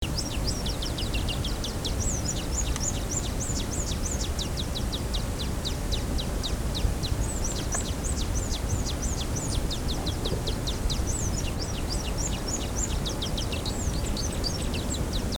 wine throated hummingbird

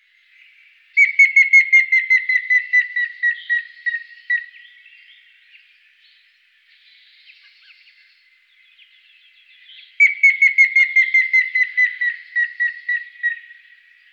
grey faced woodpecker

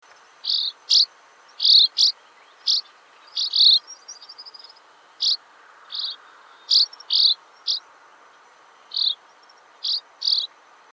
southern rough winged swallow

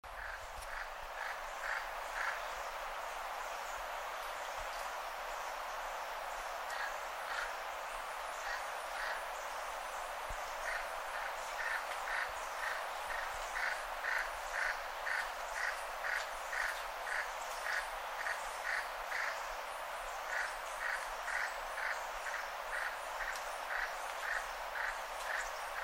yellow browed toucanet

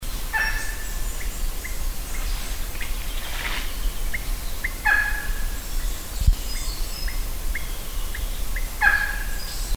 papuan eagle

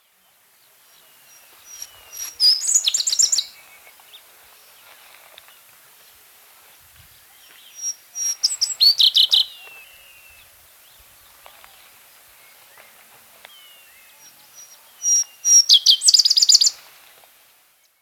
indian blue robin

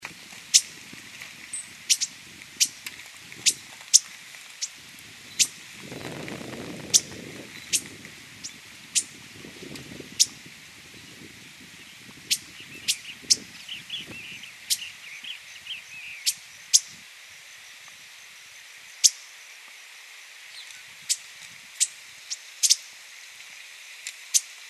cinereous warbling finch

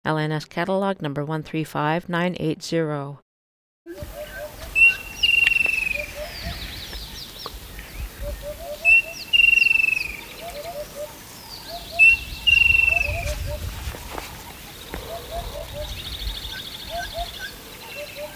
striped kingfisher

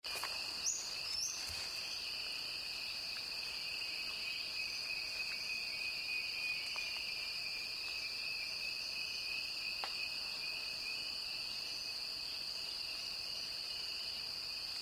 grey cuckooshrike

